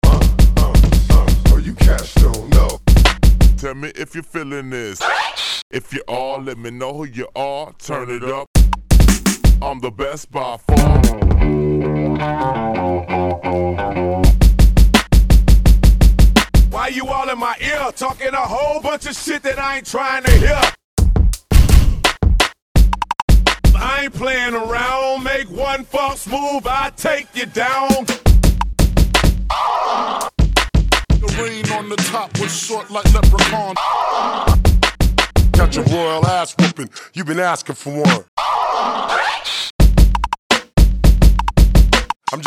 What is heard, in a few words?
DRUMS AND SHIT TALK (85bpm)